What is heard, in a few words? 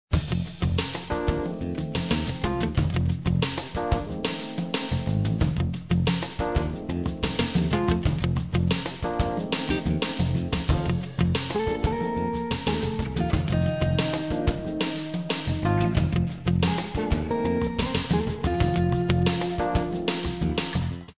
Light Jazz Smooth Ride